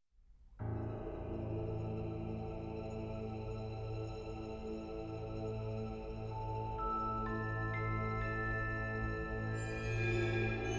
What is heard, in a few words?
TENSION; Wakfu Symphonic; Wakfu OST